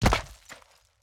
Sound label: build concrete large; Factorio